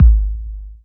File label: DR. LOOP, DRUMS; X-Static Goldmine 3